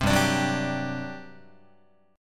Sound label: G Major 7th Suspended 4th, Guitar; GM7sus4 strummed; 3,3,5,4,6,6